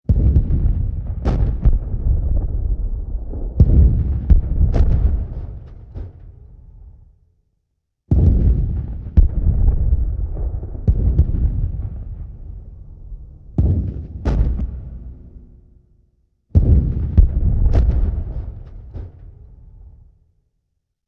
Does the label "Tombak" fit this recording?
No